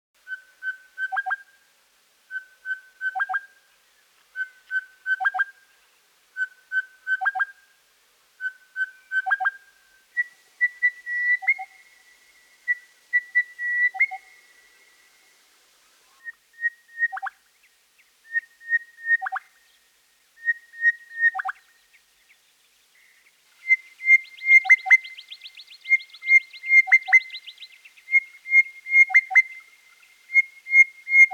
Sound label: crested bellbird